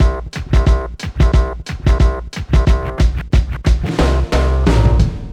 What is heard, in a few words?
Bpm90 Int L; Gassen; Mojib-Ribbon; PS2